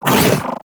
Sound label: Companion Melee Swipe, Pets; Warframe; Characters; Zanuka; Foley